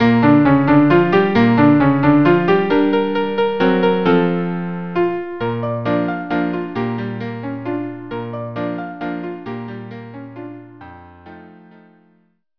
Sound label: waltz trio; binks